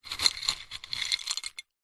food savory snack wasabi peas in ceramic bowl hand in movements; zapsplat